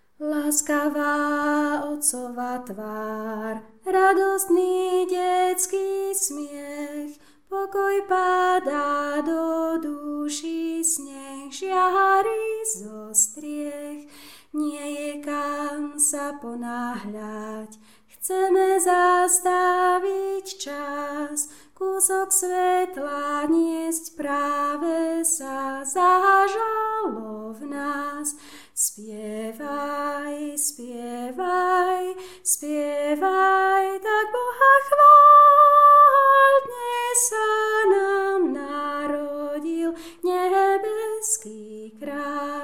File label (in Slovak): bass